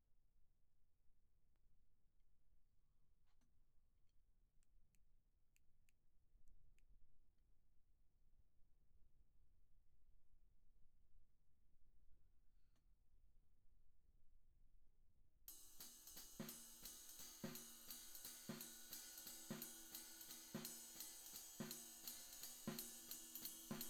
Tom

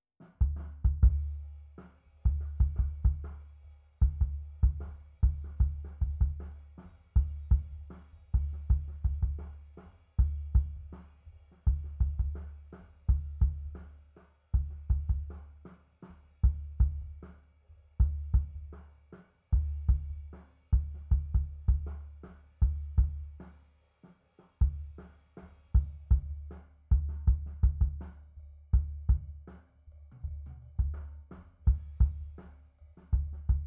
Kick SUB

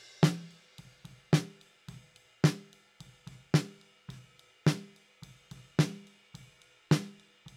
Snare Top

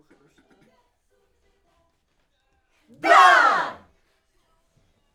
Backs AKG

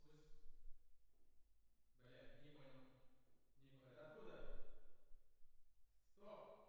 Twin R Perc

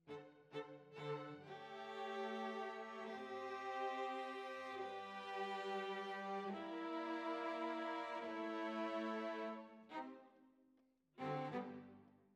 Violas, jazz for piano

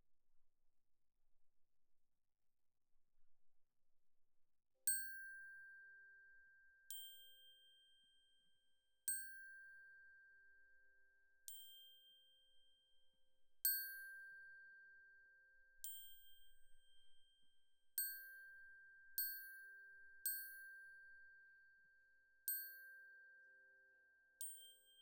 Glock Close

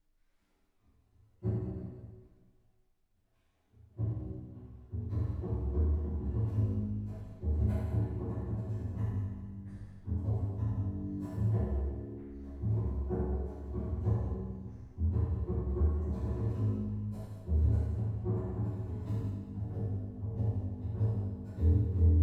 Bass

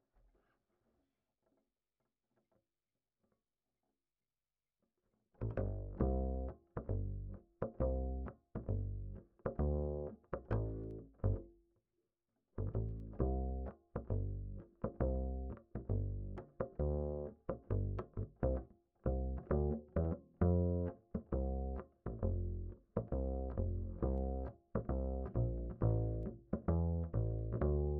Bass Line

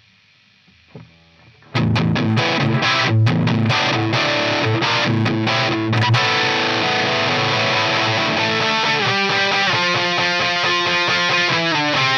Gtr

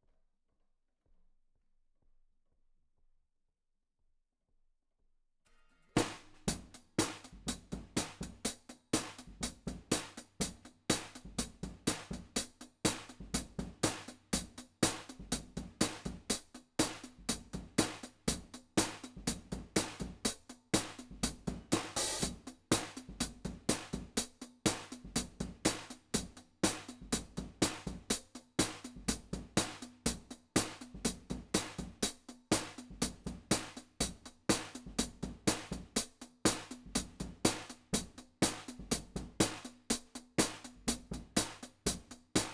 Hat

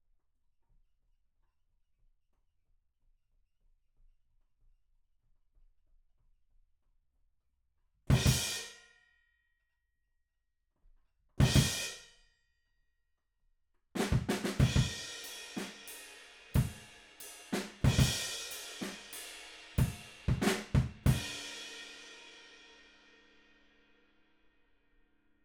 Room

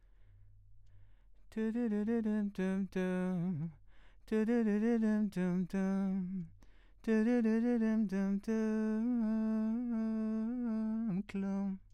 VOX

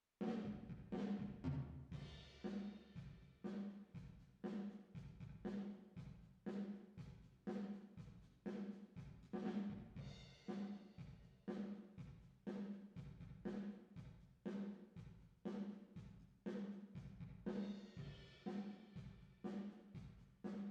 DRUM VERB

